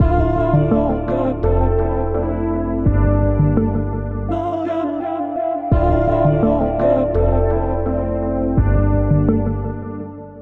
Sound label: 168 BPM D Min, RnB Melody Loops; RnB Collection; Always Yours